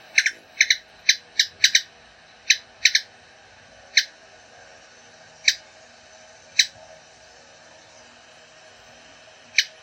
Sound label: Ruby throated Hummingbird